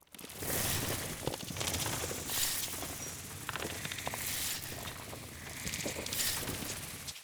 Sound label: Wisp Earth